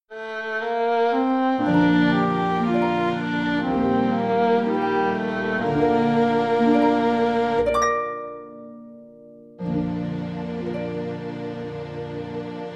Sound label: danny boy, saxophone